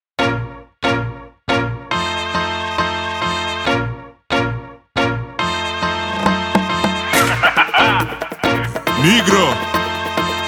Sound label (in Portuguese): samba drill